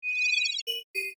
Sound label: Multimedia Beep, Multimedia, Element, Beep, Chirps, Confirm; BlastWave